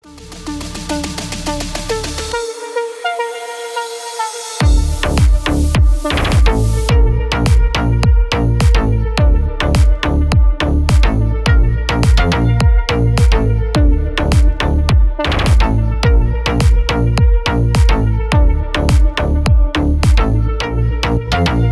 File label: pleasures instrumental